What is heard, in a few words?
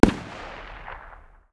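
light machine gun 762 medium distance; tacz-tweak-pack; taczmechanics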